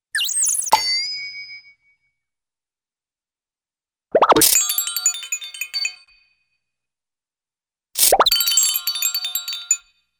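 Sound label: Funny High-pitched Bird Chirps With Bell-Chimes, Cartoon; Hollywood Edge; Cartoon Trax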